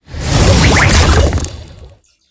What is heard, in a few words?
Monster; Zenless Zone Zero